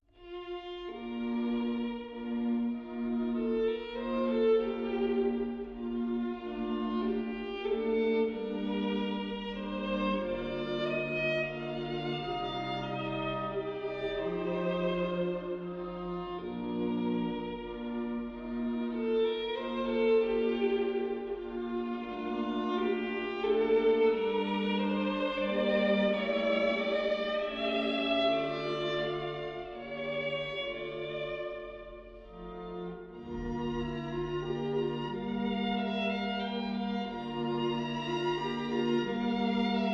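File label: classical string quartet; The Sims 3; Windows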